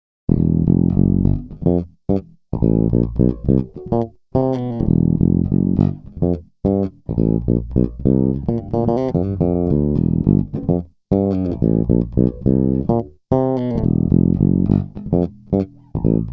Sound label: JB bridge